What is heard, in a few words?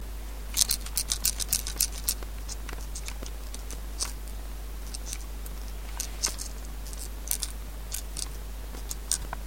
PowerShot lose